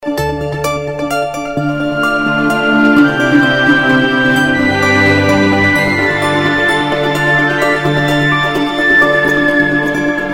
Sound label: texting ringtone; galaxy ringtones